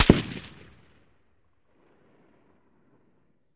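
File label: Gun Shot Exterior Artillery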